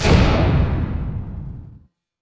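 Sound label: missile hit; fertig classic games; Master of Vega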